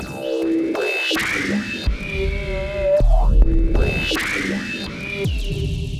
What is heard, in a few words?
Riff Fx